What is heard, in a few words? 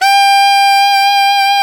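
saxophone alto fortissimo; Roland - LA Composer vol. 1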